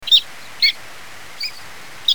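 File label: zukurokamome, glaucous gull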